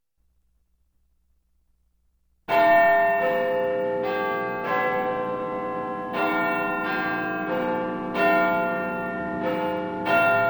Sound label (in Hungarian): A Big Ben hangja